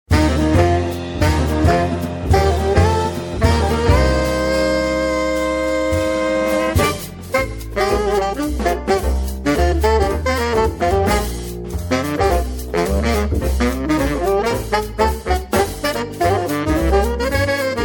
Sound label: Blues